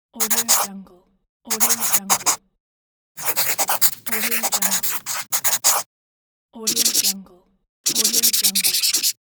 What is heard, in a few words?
handwriting; for infographic